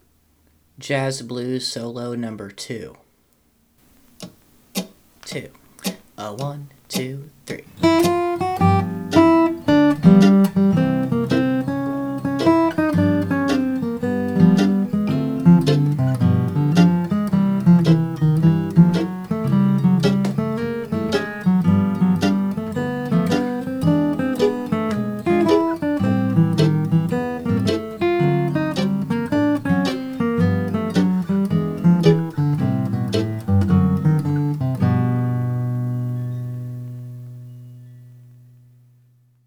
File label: Jazz Blues Etude